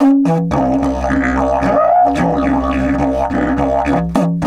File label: 108, Didgeridoo